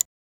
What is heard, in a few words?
clicking a ui button with; user interaction